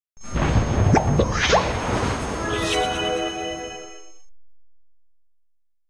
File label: jingle pub; nt1